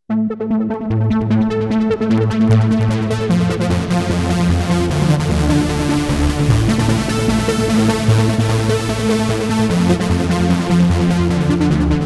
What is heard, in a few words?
Brass; Brassy saw stabs; saws and PW